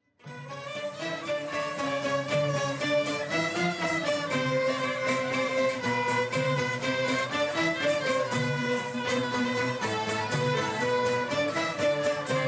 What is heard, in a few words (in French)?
Musique d'Algerie; ARGELIA